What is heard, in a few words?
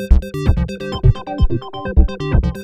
PENI PULSR, SYNTHS; Pro-Rec - Synthsonic